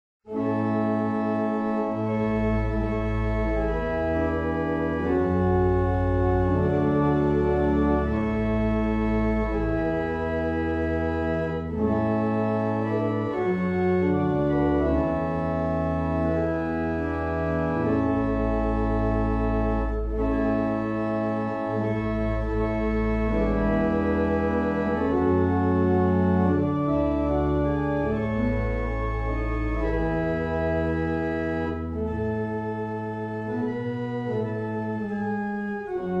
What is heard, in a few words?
Cambridge New, barrel organs; Meldreth